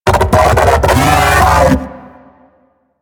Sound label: Mech Ambient Vocal; Warframe; DE; Vehicles